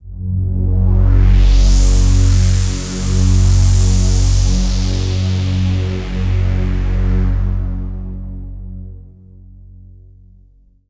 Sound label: Deep Sweep Pad; Pads; Dr. Fink's Funk Factory